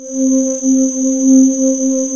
SYNTH CHOIR; Rubber Chicken Software Sample Bank; CYPERPUNK 2

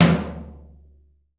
TOM R, DRUMKIT

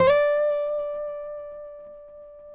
FINGER, Guitars; TAKA

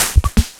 Maestro Rhythm Jester

